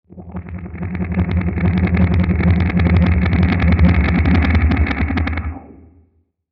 Warble Swell Low Crackle Wah; Blastwave

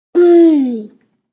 Infnt Hmm, An infant says; An Infant says "Hmmm"; Talking

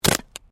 WOOD PLANK BREAK

